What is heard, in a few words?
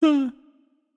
confused; smite; shiva